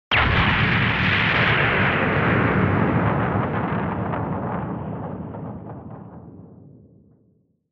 Retro Anime Explosion Low Long

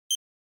NES RPG Select

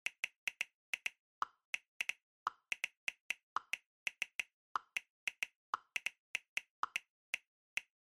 Smartphone Typing Fast

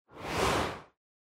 Camera Motion Mid

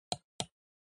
PC Mouse L

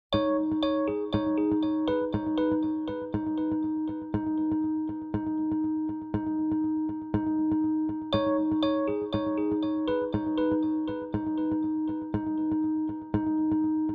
Social Documentary

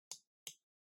PC Mouse Far L

